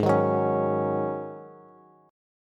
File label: Ab 7th Suspended 4th, Bass, Ab7sus4 chord; strummed; 16,18,16,18